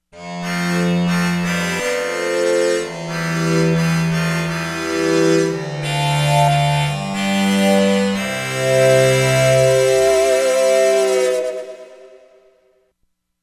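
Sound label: ethno strings, Korg; Korg MSC-13S Ethnic 2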